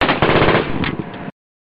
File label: M16 Short Burst